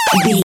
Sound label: retro collect pickup item